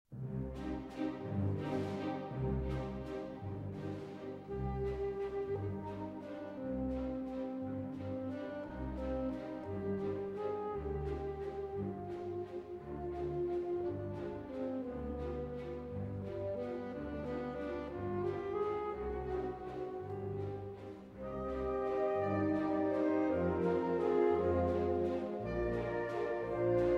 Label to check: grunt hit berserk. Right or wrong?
Wrong